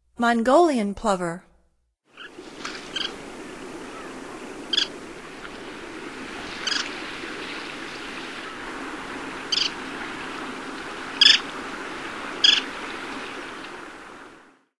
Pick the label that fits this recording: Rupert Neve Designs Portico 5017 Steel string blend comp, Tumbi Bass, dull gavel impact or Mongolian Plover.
Mongolian Plover